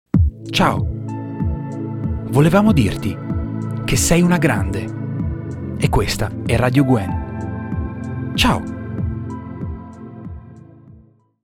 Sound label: Jingle